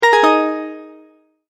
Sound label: multimedia tone end; Tones; Sound Design; McKinney Sound